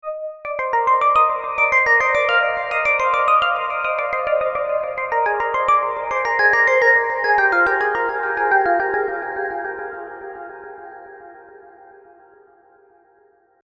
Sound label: serum synths; chiqui audio